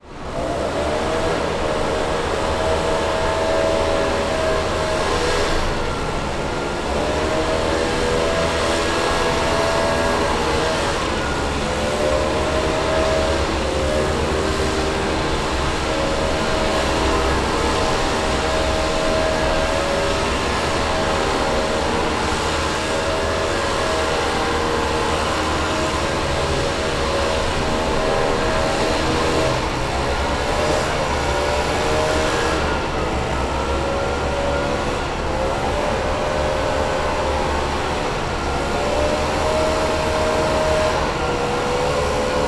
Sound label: drive; rts tutorial